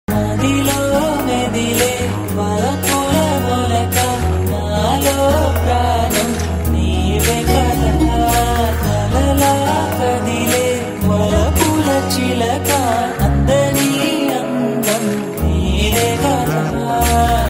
telugu love songs

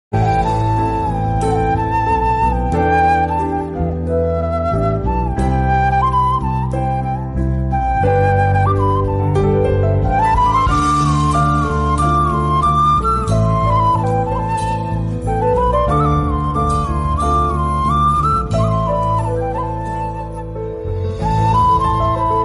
bgm ringtone love bgm tamil trending; aadhavan movie

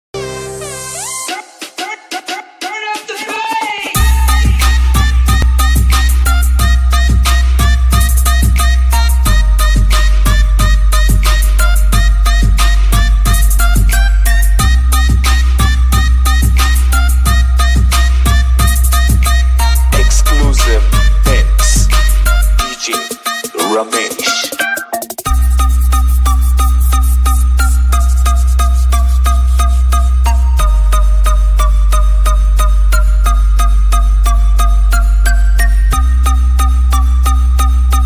om namah shivaya trance bgm

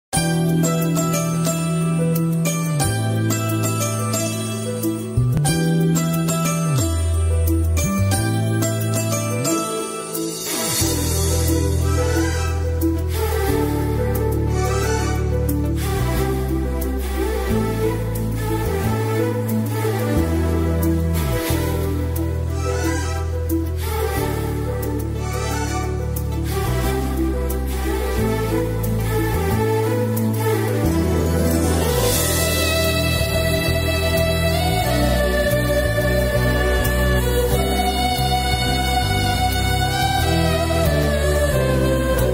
love music love ringtone; athadu

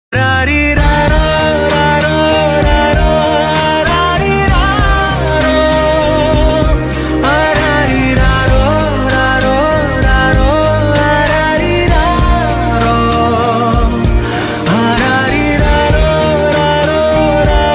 song song

